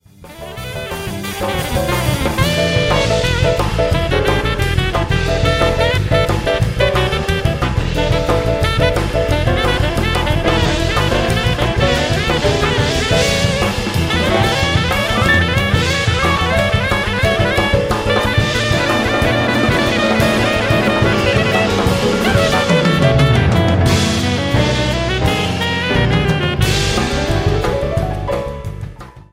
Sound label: Piano Rock